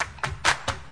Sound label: 131 bpm click click clap